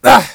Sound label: pain